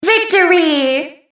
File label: victory